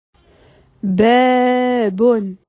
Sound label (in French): porte; بَابٌ